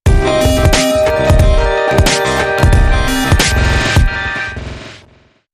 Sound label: Wonky Bounce Sting